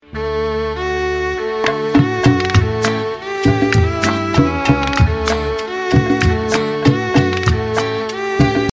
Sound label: sms tone ringtone